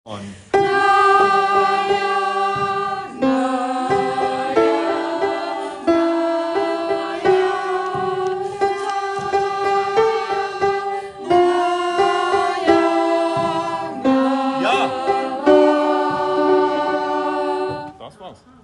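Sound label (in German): Alt 1 und 2 Takt, gospel choir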